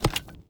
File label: click card open